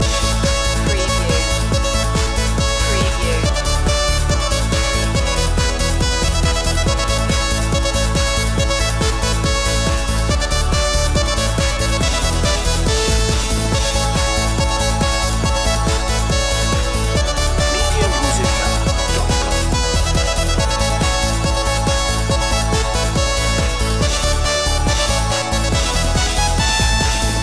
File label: christmas party loop